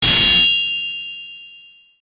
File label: battle; maoudamashii